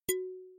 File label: radio Button Balafon Bright